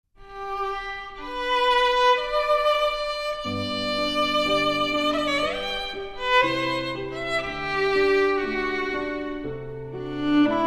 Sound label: Romance For Violin And Piano